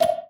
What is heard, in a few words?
button click; no longer alone sfx